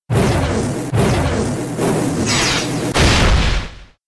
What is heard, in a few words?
Flame Charge